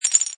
Cartoon Rattle, Object fall, drop or impact with a clinkety rattling; Comedy/Cartoon; Funny Sounds